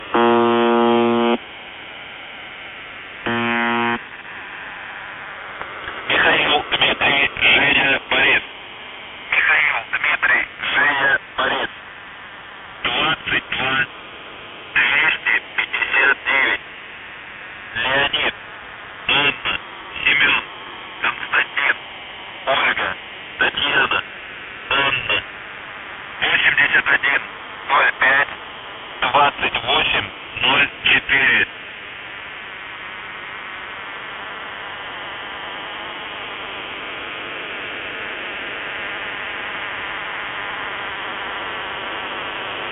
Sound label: buzzer